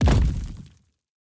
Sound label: footsteps; smite; atlas